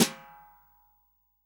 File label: SD, REAL DRUMS, SWING KIT